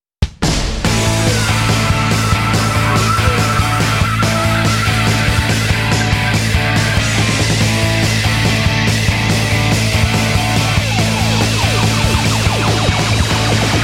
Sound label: rock out